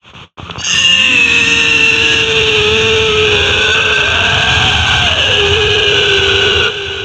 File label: gameplay failed; itgmania; ScreenGameplay